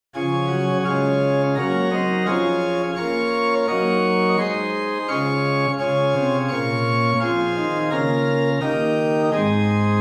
Prin 8 + Oct 4 + Oct 2, organ stops; Silbermann middle-sized church organ; St. Anne; Hw: Principal 8', Octava 4', Octava 2'; Reinhardtsgrimma

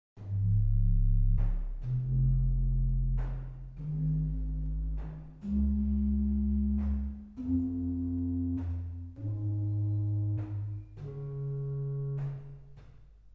Ped Subbass arp, organ stops; 16'; Reinhardtsgrimma; typical Silbermann middle-sized church organ; St. Anne

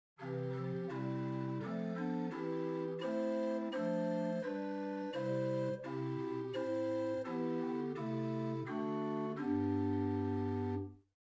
Gedackt 8, chamber organ

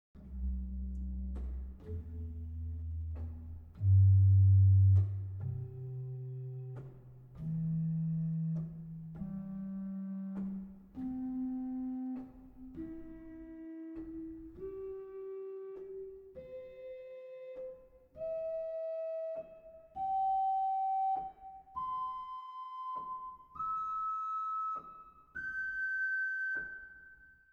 Cor De Nuit arp; Mainz

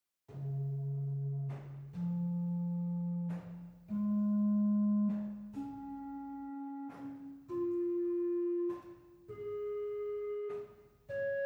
Rohrfleute 4 arp, organ stops; Silbermann middle-sized church organ; Reinhardtsgrimma

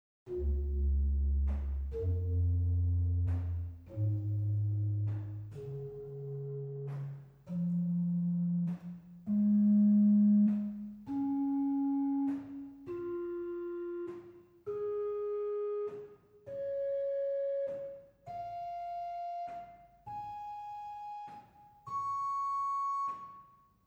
Gedacktes 8 arp, organ stops; Silbermann middle-sized church organ; Reinhardtsgrimma

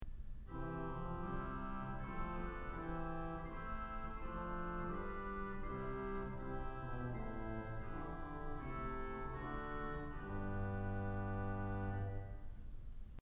Oboe, organ stops; Kellogg Auditorium

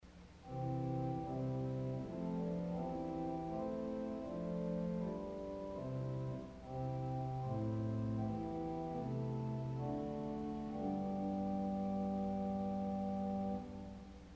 Erzahler, organ stops

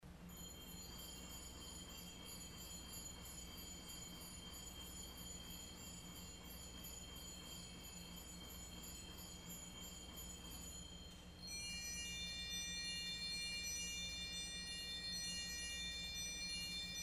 Zimbelsterns 2 then 1 then both; Fabry 1982-85; CulverAcademy

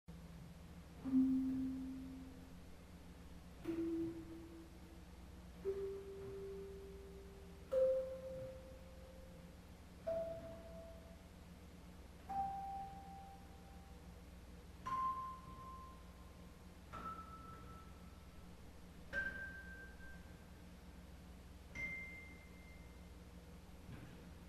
Harp Arpeg, organ stops; CulverAcademy

